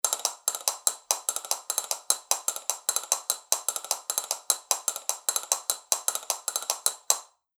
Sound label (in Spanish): castañuelas capricho tela doble caja